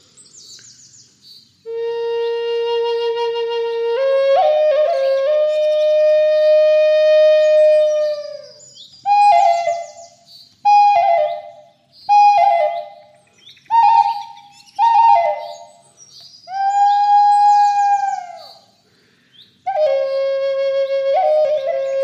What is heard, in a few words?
Lakota key B high, flute